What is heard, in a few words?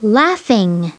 laughing; SoftKey